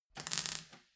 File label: weld sizzle short